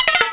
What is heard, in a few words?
Koopaling Enter Shell; New Super Mario Bros. Wii